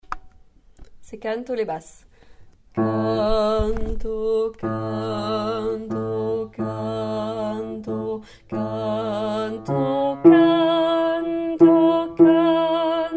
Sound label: se canto basses